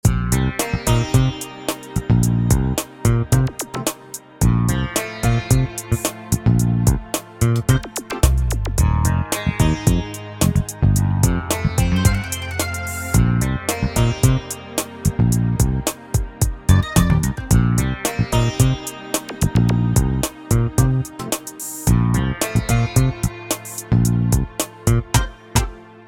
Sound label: main music; RS Games Sounds; I Doubt It